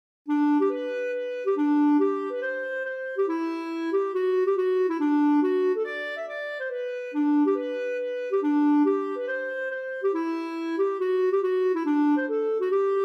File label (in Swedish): Schottis; i Nattskjort